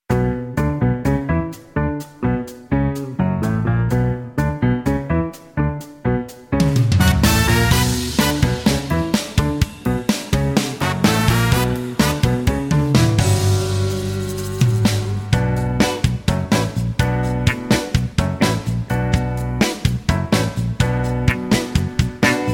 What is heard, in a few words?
Medley No Backing Vocals